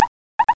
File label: squeak; SpookHouse